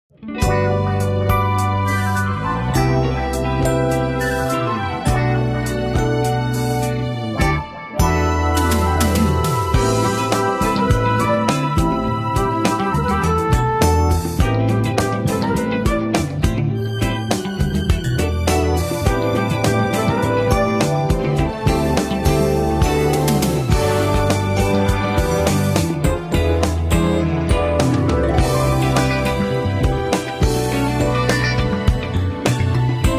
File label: Disco Downtempo; Cue Palettes; Disco Cues